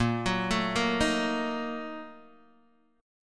Bb Major 7th Suspended 4th Sharp 5th, Guitar, Open D; x,1,4,3,1,1